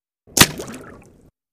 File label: Bullet Impacts Water